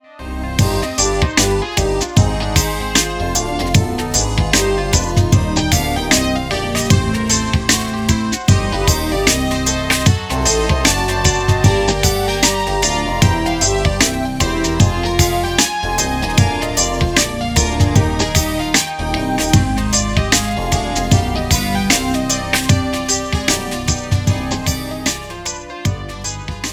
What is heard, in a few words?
fugue c minor; bach; bwv 537